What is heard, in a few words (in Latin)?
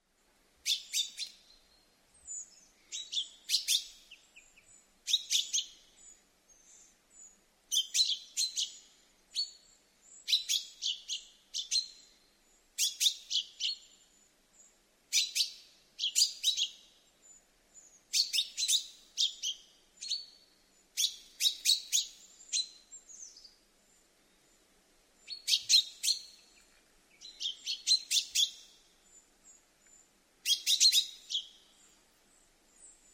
Fringilla coelebs; SETE